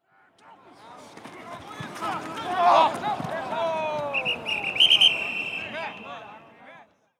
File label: football training